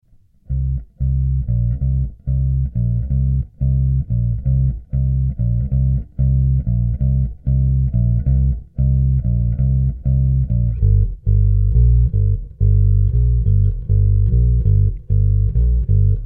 bass

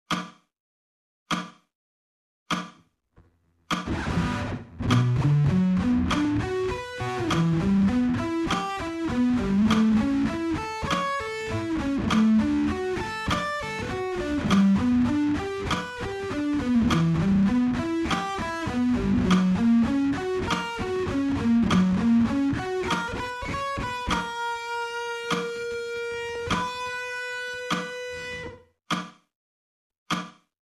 sweep picking slow

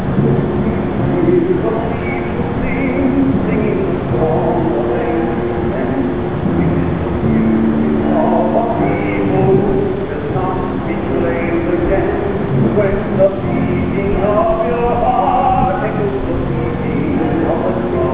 people sing

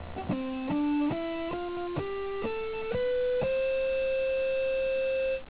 c major scale

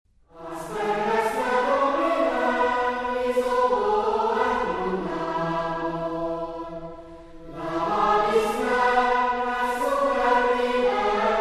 asperges, latin mass

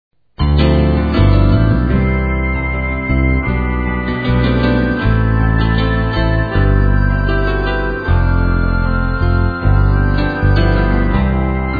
piano

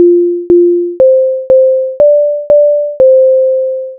Twinkle

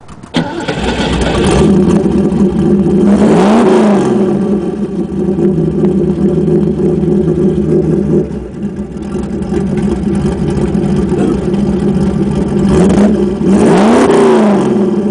shed

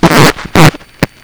fart

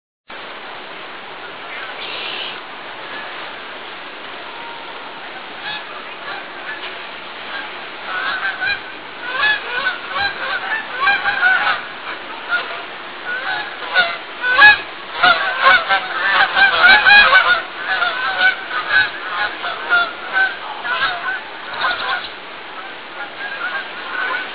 goose canada; birdsongs

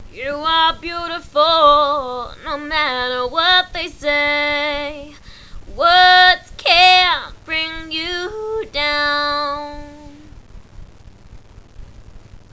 sing

